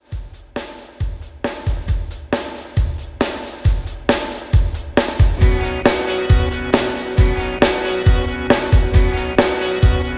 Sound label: 90s Hard Rock